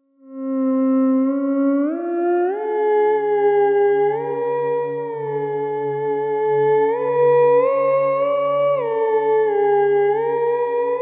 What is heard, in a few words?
Night Rain, theremin